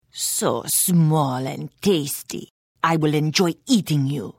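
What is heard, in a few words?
Attack, Khajiit female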